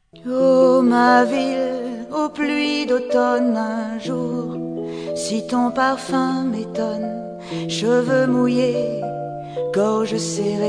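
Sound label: Soft, Songs, French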